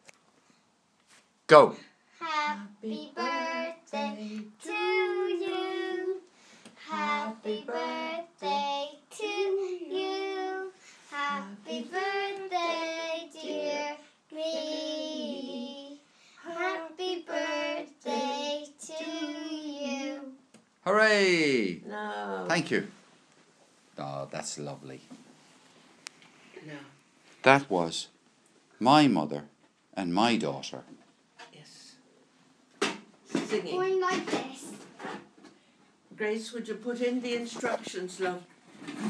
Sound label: the singing grandmother daughter